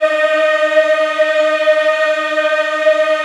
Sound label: D#4 MW EH -L, Choir Acoustic; USB Soundscan vol.28; AKAI